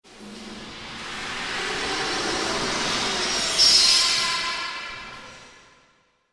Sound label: Spectral Assassins, evil sound effect; horror; Andrew Fleming